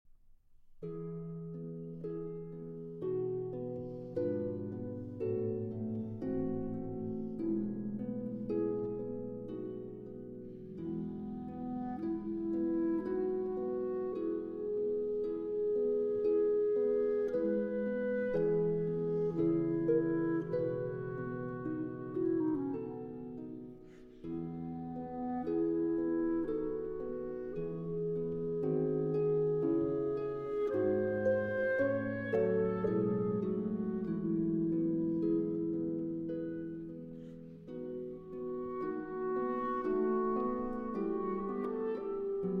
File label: Cello Sonata in A Minor, Adagio; Schubert; D. 821, Arpeggione II.